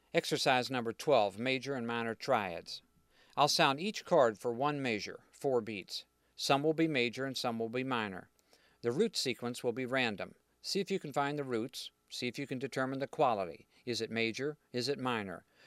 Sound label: Major & Minor Triads Mixed 1 Bar Each